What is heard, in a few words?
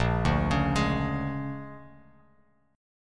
A sharp 7th Sharp 9th A sharp down Bass Standard; A#7#9 arpeggiated; JGuitar